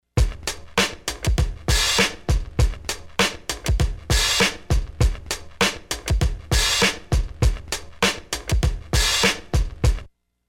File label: Bright Plate, Lexicon MPX 1; Factory Programs